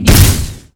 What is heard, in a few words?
Stamp Att; Ragefighter